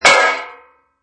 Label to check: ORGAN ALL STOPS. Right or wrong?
Wrong